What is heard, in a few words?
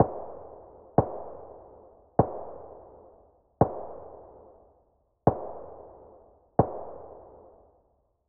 adar tap slow, weapons; SPT Battle Ambience